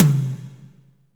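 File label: tom, real mega drums; Best Service; Real Mega Drums VOL-1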